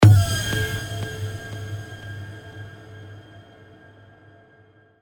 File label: horror